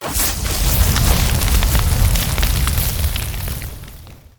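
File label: Flame Breath Sweet; Evolve; Goliath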